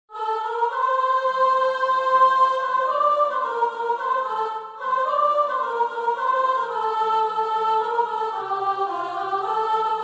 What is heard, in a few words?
Sop from Chorus